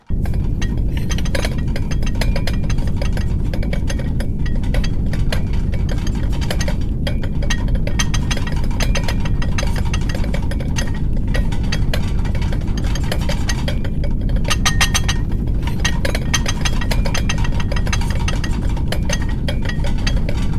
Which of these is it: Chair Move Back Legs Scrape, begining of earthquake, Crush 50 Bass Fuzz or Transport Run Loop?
begining of earthquake